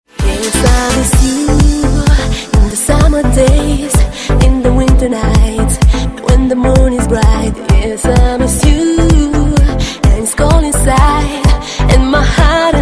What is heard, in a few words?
romanian ringtone